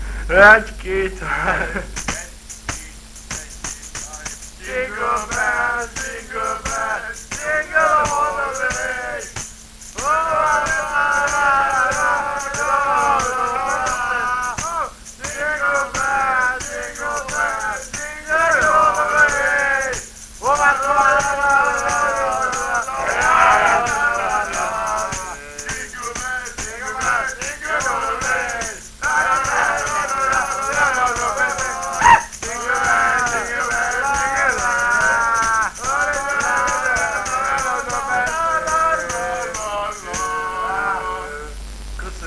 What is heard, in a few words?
jingle bells